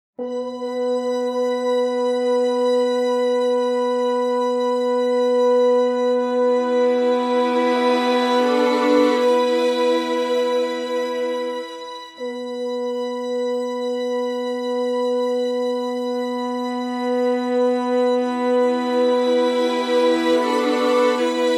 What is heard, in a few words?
Instrumental